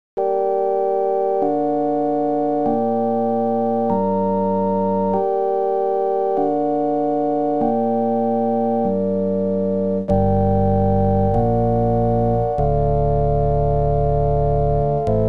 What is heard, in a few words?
OPL2